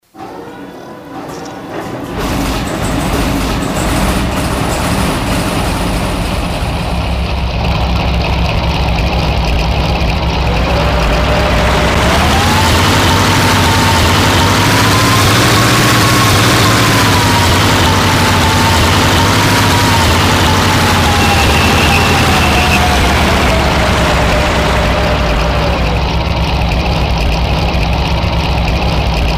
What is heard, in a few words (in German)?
Panzer Elefant, modellbau; Soundbeispiele